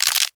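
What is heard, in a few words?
bone break; pop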